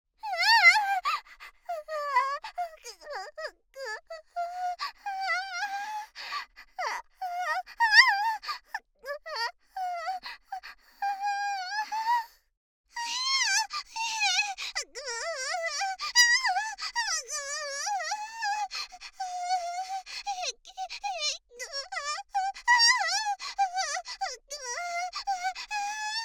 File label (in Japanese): レイプ SEX・無理矢理に媚薬を使われ泣きながらの激しい喘ぎ声と悦びの声; ストレートお姉さん系カノジョ